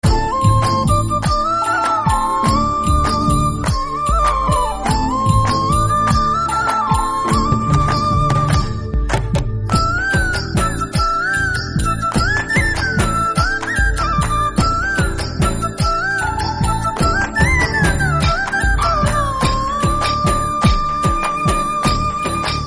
ringtone

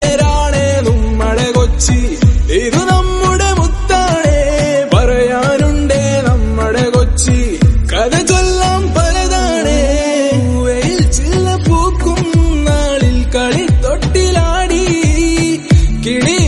mixed song

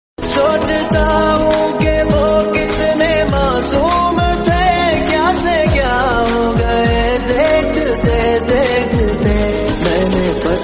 hindi songs

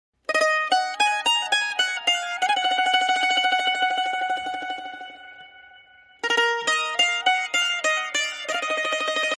punjabi virsa tone

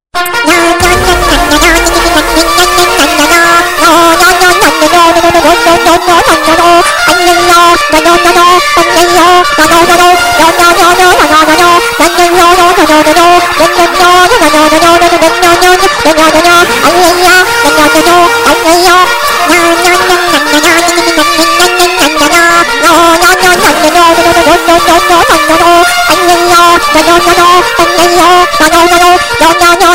loud alarm tone

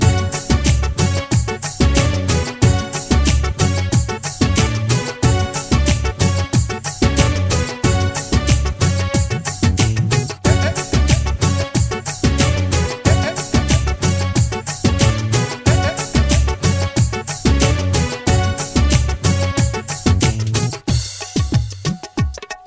instr

